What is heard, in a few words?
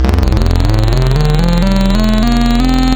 SharpSweep, wavetables; Waves Codex